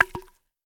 Log Dirt Hit

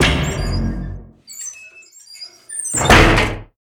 Hatch Open From Top

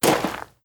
Dirt Walk

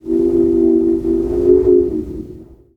Whistle; moul

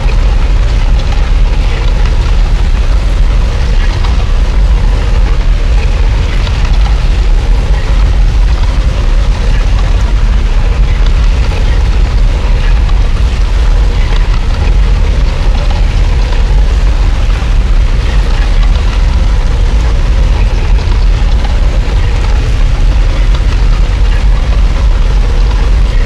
Floor Elev Pillars Loop

